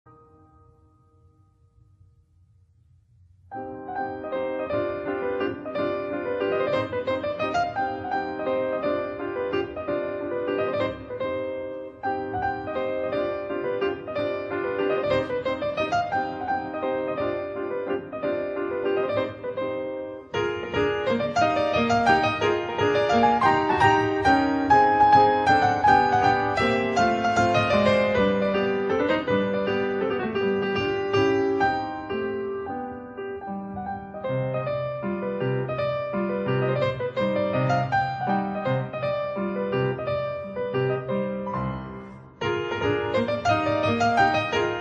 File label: Mazurka; Kosenko